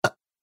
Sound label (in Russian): Легкая девчачья отрыжка